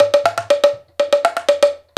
120 LAMBA, African Ethnic Drums; Spectrasonics; Supreme Beats

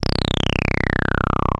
Single Electric Basses, Dance Floor Bass

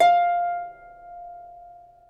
F4 CELTIC HARP; Northstar - New Gold VOL-2

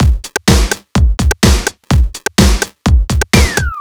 Full, Drum Loops 126bpm, Nu Dance Electro; Zero-G - Soundclash; Downward Spiral

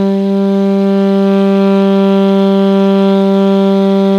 ALTO PP G 2, saxophones; Greytsounds - Philip Wolfe David Crozier's Saxophones

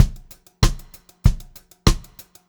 VARI, Pop-Rock Drumloops

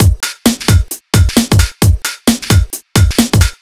132 No Perc, Drum Loops; Zero-G; Soundclash Nu Dance Electro; Acidized Wavs; 132bpm

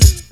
DOOP KICK; AKAI S-Series CD-ROM Sound Library VOL-4

